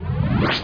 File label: teleport reverse